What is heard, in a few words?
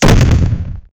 a cannon charging up rumb; preparing